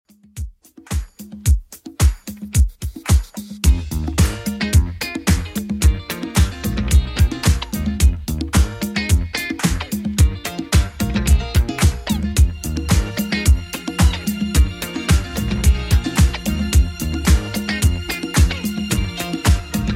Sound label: 70s Redrum 110bpm